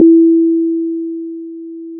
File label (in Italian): Corda freq